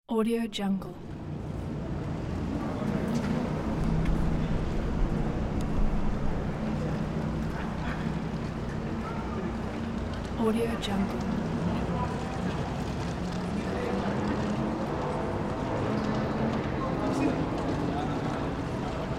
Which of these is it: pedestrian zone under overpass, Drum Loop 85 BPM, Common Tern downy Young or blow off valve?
pedestrian zone under overpass